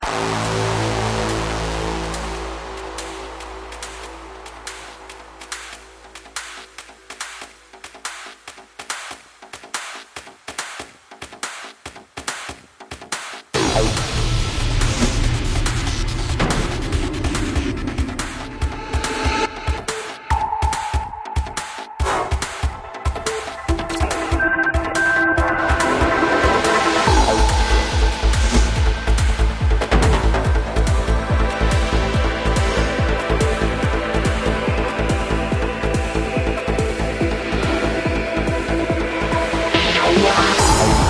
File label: Progressive Trance; Style: Psychedelic / Progressive; Label: YSE